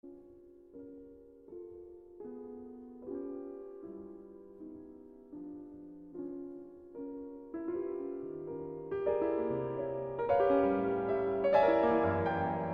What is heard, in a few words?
Piano Sonata No. 9 in F Major